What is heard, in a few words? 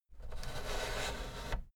fader plastic scrape double down slow